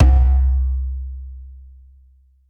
deep tom single hit c key, Royality free tom tuned to the C note. Loudest frequency: 181Hz